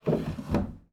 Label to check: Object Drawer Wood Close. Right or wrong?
Right